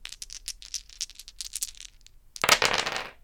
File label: dice